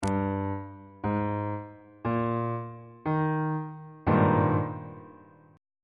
a flat major 7th suspended 2nd 3rd inversion on bass clef